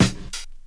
2000s rap snare drum sample f sharp key

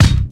shiny bass drum b key

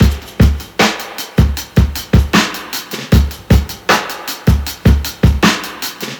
77 bpm drum loop c sharp key; Duration 0:06; Samplerate 44100 Hz

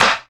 airy sizzle acoustic snare sound a key; snare-drum-samples

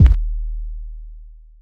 big kick sample d sharp key; kick drum samples

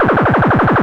Fm - 96, synth; instruments; loops

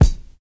hq clear kick single hit a sharp key; kick drum samples

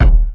original kickdrum sound d sharp key

